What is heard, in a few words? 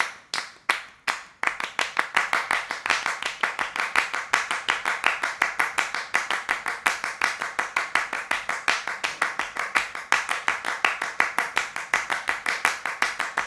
claps